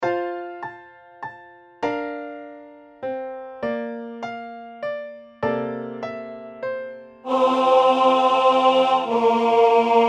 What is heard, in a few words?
Tenor; West Side Story